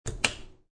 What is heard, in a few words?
Clicking off stovetop burner; Click off Burner 1; Clicks; Objects